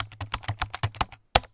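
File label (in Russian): продолжительный набор букв на клавиатуре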